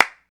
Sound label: clear clap E key, Royality free clap single hit tuned to the E note. Loudest frequency: 2068Hz; percussion; single hits one shots